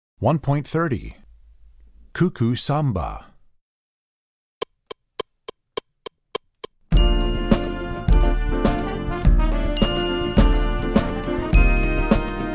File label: Cuckoo Samba, Percussion; Percussion Book 1